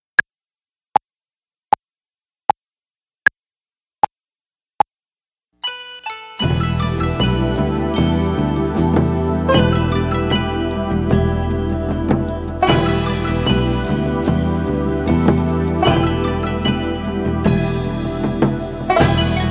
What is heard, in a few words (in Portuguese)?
sem flauta guia; Obra Obrigatoria Nivel 2; Concurso de Flauta de Bisel